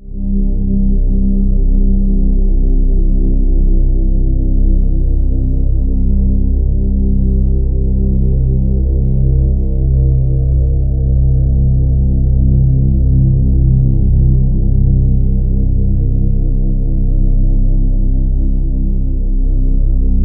bass, Ominous Drones, LOWTONES; USB Soundscan vol.48; AKAI

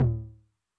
Med Tune Long Decay, 909, MID TOM; classic machines; 909mod; MT - MID TOM

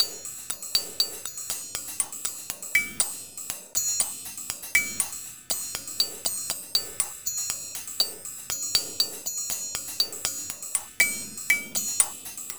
Bellz N Hatz More, Percussion Combos, 120; M-Audio; Pro Sessions VOL-38; Abstract World Fusion 2

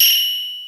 FM SMALL BEL; Crypton - DrumBase5000

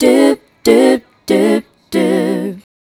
Female Vocal

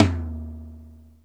Yamaha RX-5

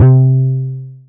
ARPY, SYNTH FUNK; Zero-G; Funky Elements

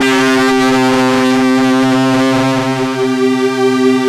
LEADS; Masterbits; Vintage Voltage

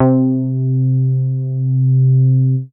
C3, BASS; Q Up Arts; Dream Experience; BASS BANK 15

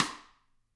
GRETSCH SNR, Double Platinum Drums

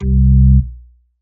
DM ORG1, Dance Mega Synth

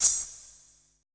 E SHELL, ETH PERC

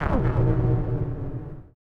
AMBI FX

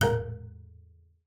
B LEG A#4; BASS COL LEG; Xsample VOL-5

